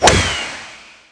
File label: Swing Drive; pangya fantasy golf